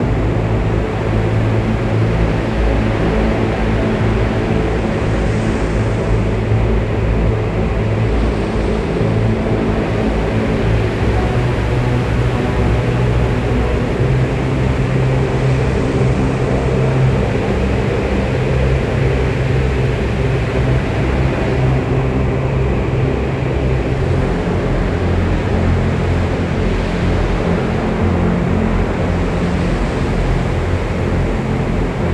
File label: Music Ambience; gamerip; Hellbound